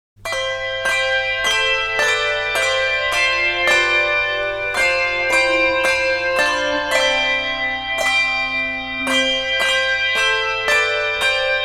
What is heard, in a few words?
Christmas Handbells